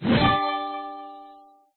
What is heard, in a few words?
counter last